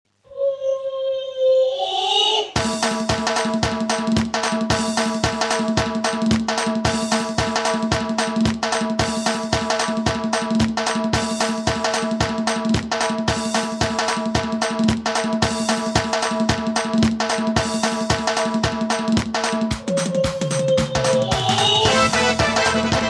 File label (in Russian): Чеченская Лезгинка Попурри